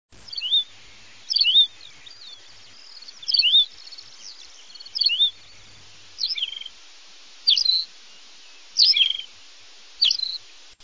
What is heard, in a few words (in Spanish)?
canto bisbita campestre; aves campo